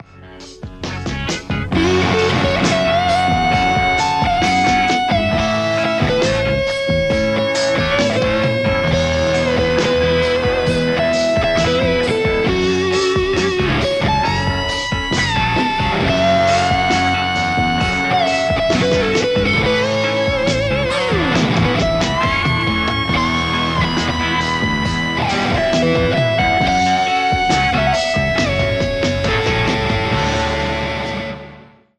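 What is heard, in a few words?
Telecaster; amplitube3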